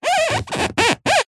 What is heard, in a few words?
office chair leather executive squeak creak rub